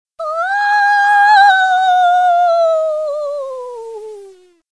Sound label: Ghost Inside House